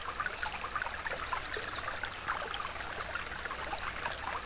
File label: Water, 自然, 水声; 特酷声音素材库